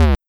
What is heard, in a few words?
bump; tanetane